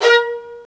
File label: STR, STRINGS; Roland - LA Composer vol. 1